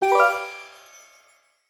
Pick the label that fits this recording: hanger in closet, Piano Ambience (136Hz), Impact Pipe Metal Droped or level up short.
level up short